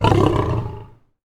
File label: african lion growl